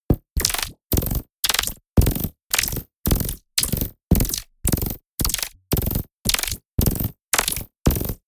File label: sci-fi machine gun